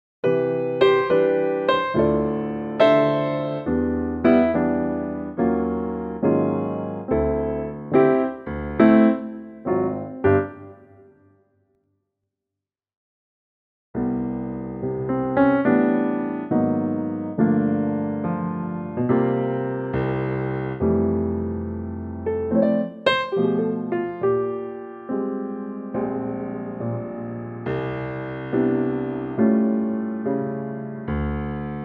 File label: C piano